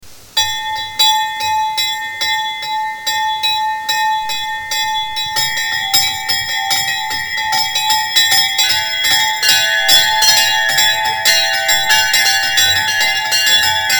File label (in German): Glocken Laeuten